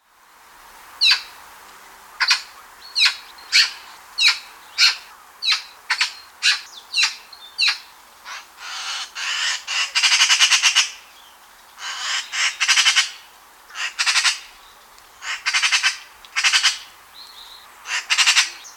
Elster diverse Laute

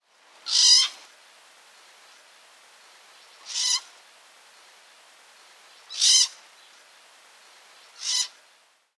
Baummarder Kreischlaute

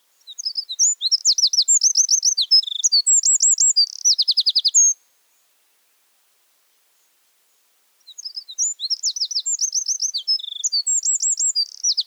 Zaunkoenig Gesang

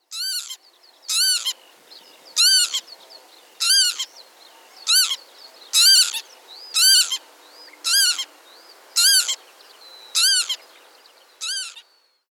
Kiebitz Flugrufe

